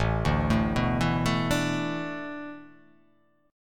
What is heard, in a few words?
A Suspended 2nd Suspended 4th; Asus2sus4 arpeggiated